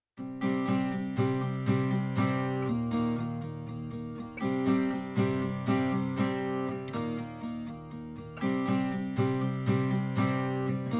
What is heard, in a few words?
acoustic strummed pur